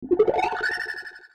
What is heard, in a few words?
magic